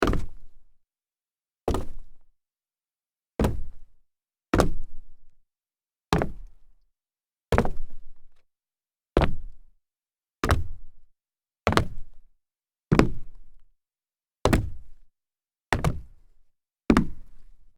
sneaker jump solid wood

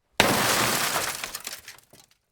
smashing car window

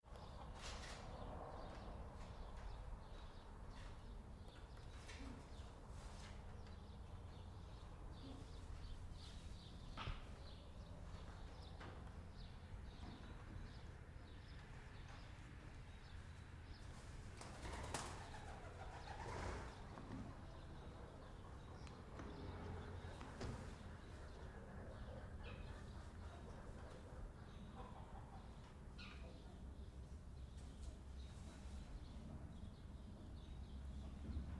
inside barn with chickens

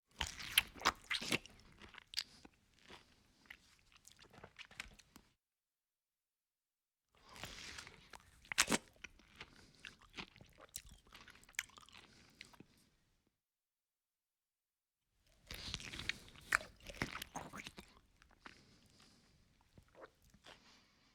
human eating peach

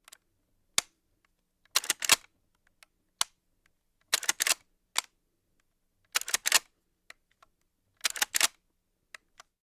shot gun handling

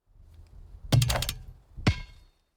basketball shot rim short

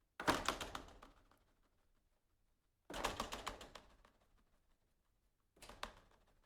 projector screen flapping

